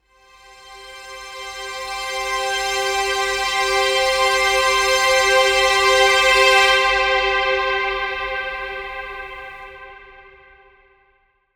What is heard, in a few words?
String Swell - Cm 70Bpm; Construction Kits; STEMS; Ghosthack; SkyRealm; Kit 19 - Ode To Daenerys